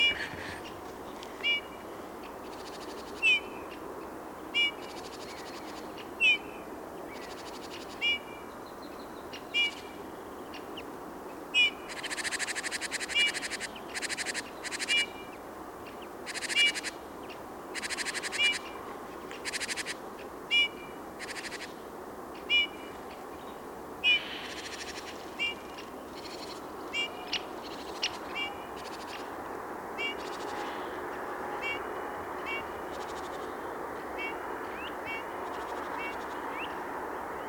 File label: Pyrrhula pyrrhula; SETE